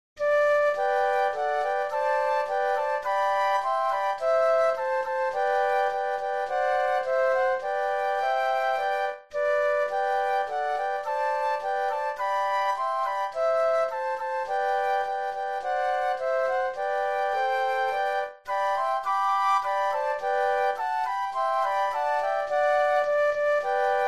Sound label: flute trio